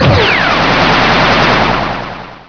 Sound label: laser